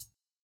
hihat; Reference Beat BPM120; j city pop 1980

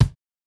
kick, house

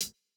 Jrock hihat

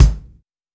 Rock kick; Reference Beat

